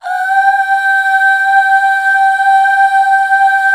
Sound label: UUHH, Back Uuh Fem